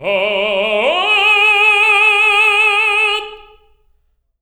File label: TEN G#3, TENOR; TENOR OCT